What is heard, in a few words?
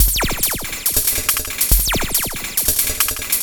Percussive